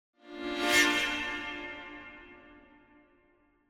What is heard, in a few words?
Symphonic Hit Short Show Up Chords; Hollywood Edge; sound designer's toolkit v2